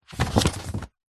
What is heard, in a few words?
office file folder wallet card close